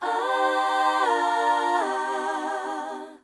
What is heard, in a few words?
VOX